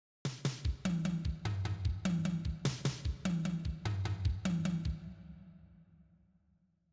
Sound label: triplets